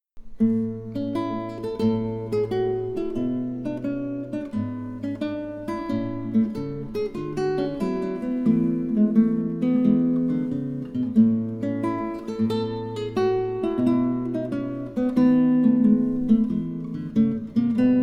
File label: Estudio, guitar; Dionisio Aguado; Estudio 7 Libro 3